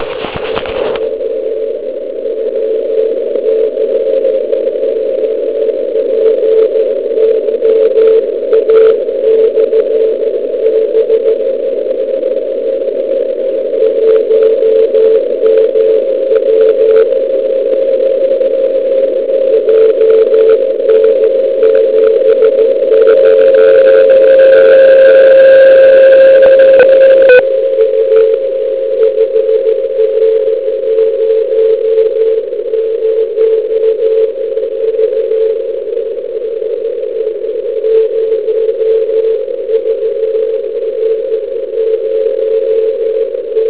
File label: Beacon; 3578.70 kHz OK2PYA/B; vysílá nepřetržitě, identifikace jednou za 3 minuty JN79TI ANT? 1mW; MIB; RX IC7600 zapnut NR, APF šíře 160 Hz